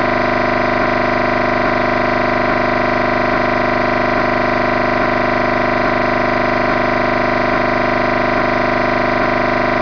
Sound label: NFM